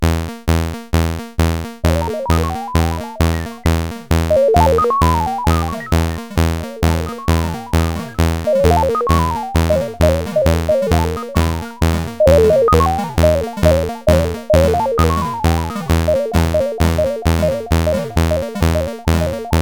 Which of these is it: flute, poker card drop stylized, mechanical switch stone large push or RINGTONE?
RINGTONE